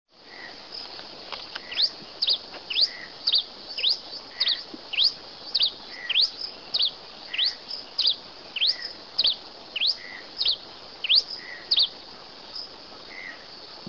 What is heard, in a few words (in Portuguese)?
Lanio pileatus Tico tico rei cinza